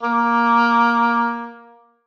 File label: oboe a#3